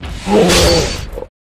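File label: Ice Bear attack bottom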